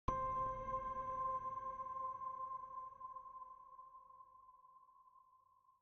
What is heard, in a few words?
Desert constellation Note Solved C4; Desert SFX; Desert Mech